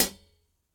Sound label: HIHAT brush closed